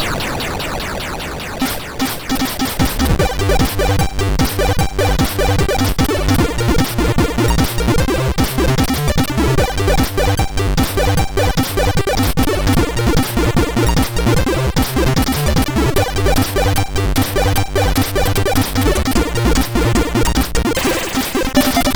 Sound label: Hsud, MOS6581R4; sid